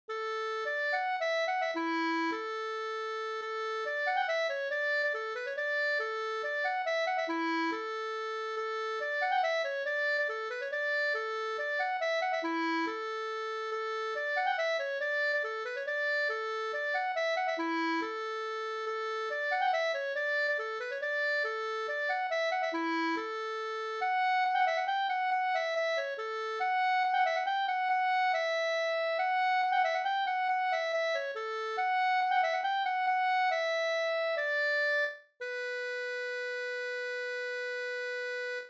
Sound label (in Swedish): folkdans; Snurrebock fr Mp